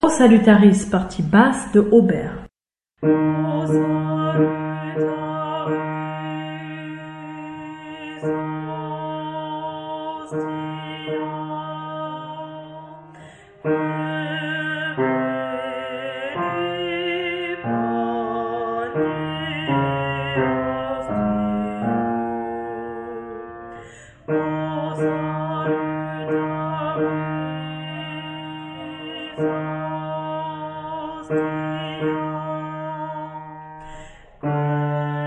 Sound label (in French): Basse; O Salutaris; Auber